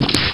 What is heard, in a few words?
arm rip, zombie